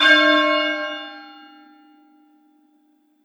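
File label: bell sharp hum prime; cccbr; glossop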